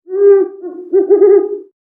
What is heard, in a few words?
Owl Call; Valiant Summoner